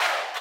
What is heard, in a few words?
crowd